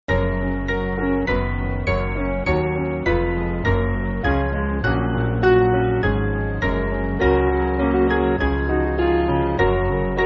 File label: Ode To Joy, Piano; Sing To God